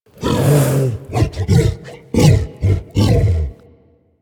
Juggernaut Truffle Fidget, Infested; Warframe; Truffle Hunt

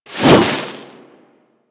Ice Proj Whizzby; Enemies; Abilities; Warframe; Corpus; Arachnoid Camper Terra

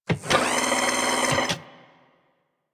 Foundry Motor Forward; Warframe; Liset; Hub; Machines; Tenno Foundry

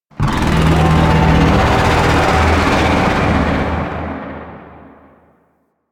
Void Dragon Vocal Idle; Warframe; Duviri; DuviriVoidDragon

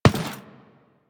footstep; Step Default, Footsteps; Warframe; Teshin

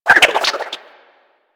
Grapple Rope By

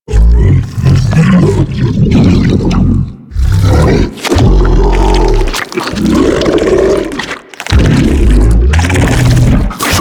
Roomba Mouth; Helminth; Warframe; Hub; Liset Alchemy Lab

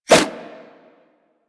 Nunchuck Whoosh; Warframe; Infested

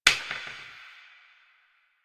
Plastic Broom Drop; Warframe; Nineteen Ninety Nine

